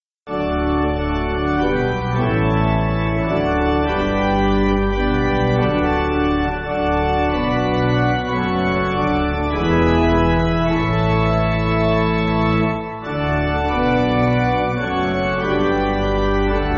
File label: Pipe, Pipe Organ, Music Backing; Old 81st